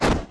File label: spirit attack; FlyForInsanity